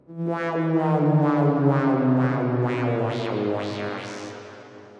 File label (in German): filterspiel, Trautonium